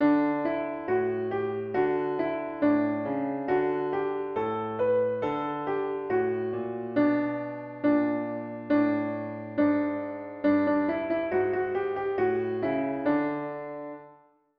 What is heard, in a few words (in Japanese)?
ピアノ